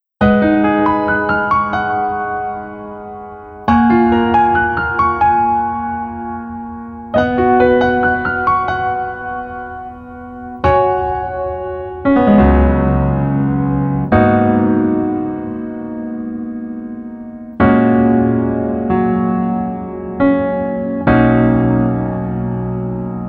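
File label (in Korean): Piano version -1키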